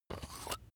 razor battery compartment open

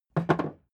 hammer wood put down

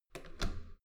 metal door heavy handle down soft

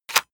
plastic device handling clack push

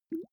small bubbles single soft

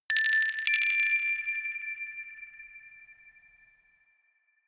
notification glockenspiel rattle fourth up

